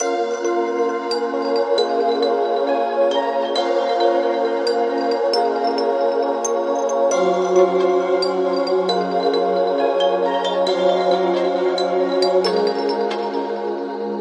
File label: glassy, Electronic Loops